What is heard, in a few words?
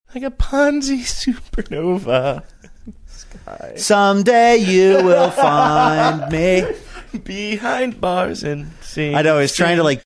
making up lyrics singing; ponzi supernova